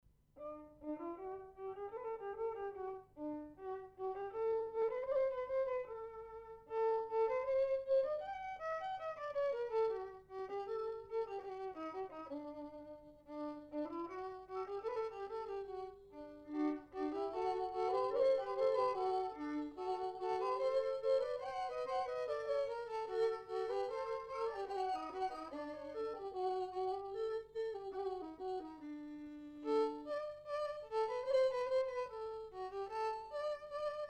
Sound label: Violin Duets